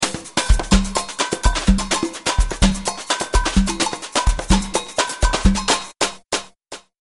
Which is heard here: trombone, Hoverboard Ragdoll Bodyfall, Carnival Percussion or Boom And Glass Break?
Carnival Percussion